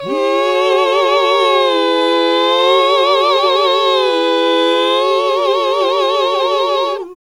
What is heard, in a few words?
GOSP., GOSP. MMMhh; Sound & Vision - Gigapack II CD 1 (Roland); HAL Gosp. Mmmh